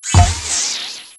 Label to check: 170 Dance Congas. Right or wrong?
Wrong